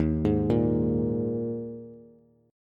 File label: Eb Suspended 4th Eb Bass; Ebsus4 arpeggiated; JGuitar